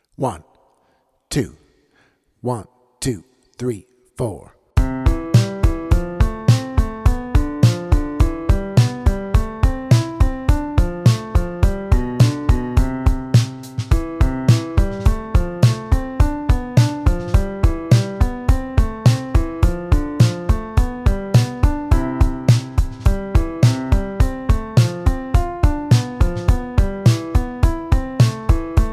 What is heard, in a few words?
triad etude backtrack